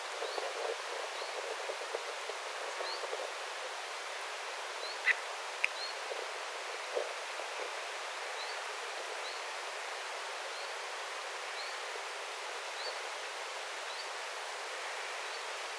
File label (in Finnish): noin sadan metrin päästä kuuluu vit-tiltaltin ääntä, vit-tiltaltin ääntä kuuluu kauempaa